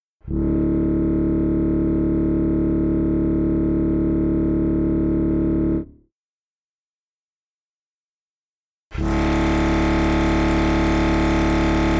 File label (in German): Posaune; Velesovo